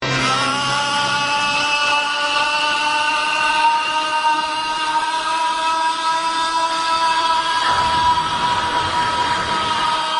ahh

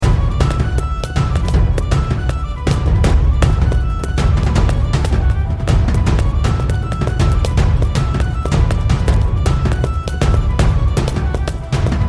emote irish jig foley music loop; fortnite emotes

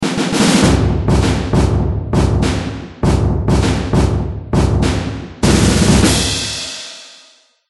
dance soldier; fortnite emotes

